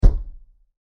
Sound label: Bang On The Door, Door Knocking; Doors; Crafted Signals